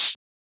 Shaker

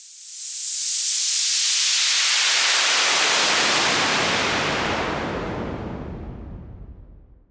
Fall Clean, Falls

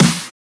Snare; FLPRO; Snares

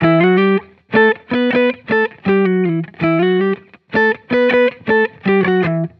Electric Melody Loop - 160 BPM E Min; Cymatics

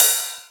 Ride; FLPRO

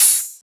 Open Hat; FLPRO

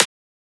Transient; FLPRO

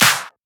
Shifted Clap, Claps; FLPRO

